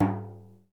deff tap; Deff Bendir &Req

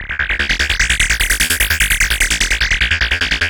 Analog Rhythm

